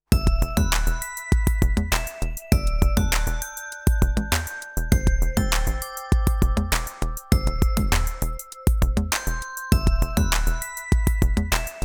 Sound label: Electronica Wet